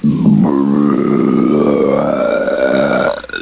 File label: bump; quake2; fat